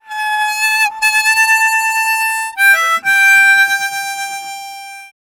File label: Harm 129bpm G end, Harmonica; Quazibeat - African Secret